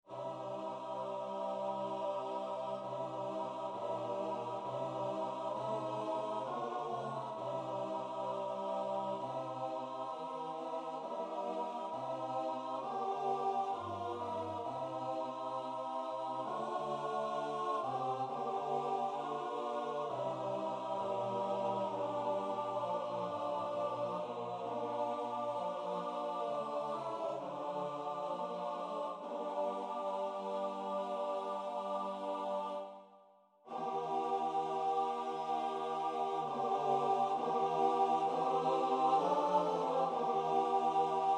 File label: kyrie eleison playback